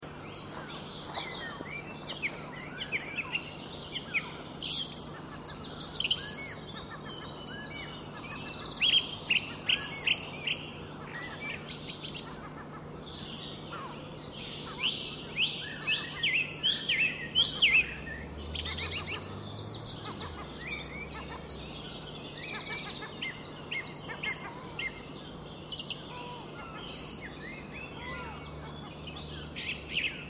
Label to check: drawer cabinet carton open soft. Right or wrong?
Wrong